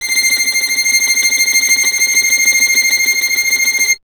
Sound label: STR VLN, Vln Trem; Solo Instruments; Miroslav Vitous